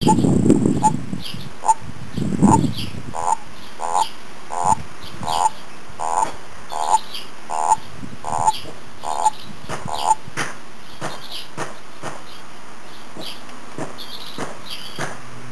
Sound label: bufo bufo